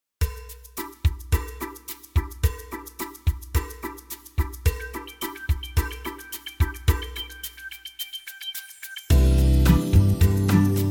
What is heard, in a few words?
Bossa C, backing track